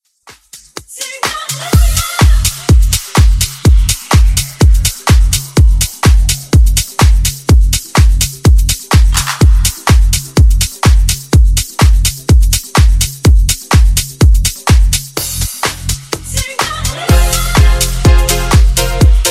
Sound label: funk, 125 bpm